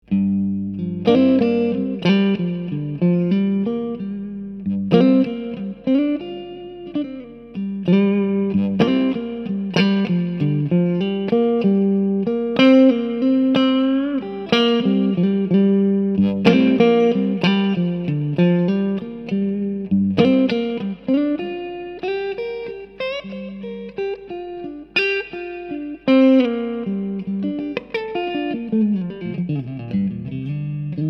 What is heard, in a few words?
Clean Duesenberg P90 Domino